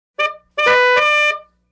Old Car 2 Tone Fanfare

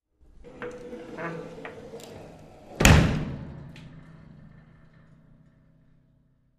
Door Slam Lid; door slams

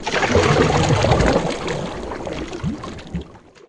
Canoe Paddle Stroke Underwater

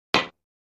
Button Hollow Metall

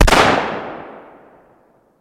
Gun Ar15 Sngl Shot

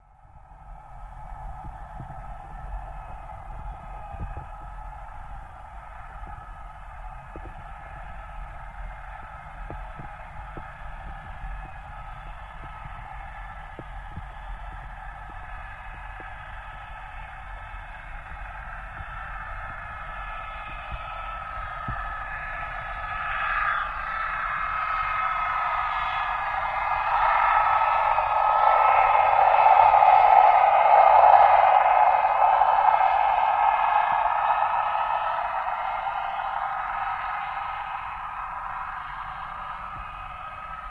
Torpedo Jet By, torpedos

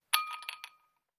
Ice Cube Glass

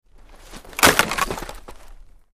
Football Block, football

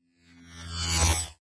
Button Load Beep; buttons & beeps

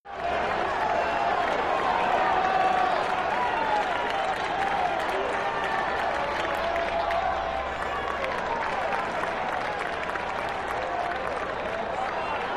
Arena Crowd Clap Che, sports arena

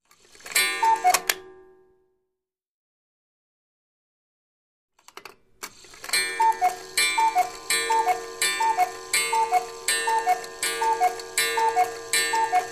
Coo Coo Clock 2 Coo Coo, clocks

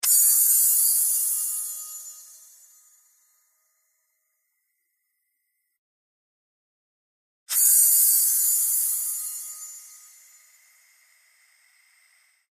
Oxygen Tank Releases

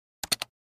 Button Hollow Plasti

